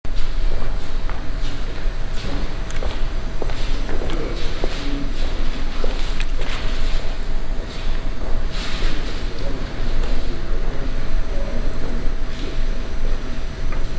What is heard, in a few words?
jubilee line, train sounds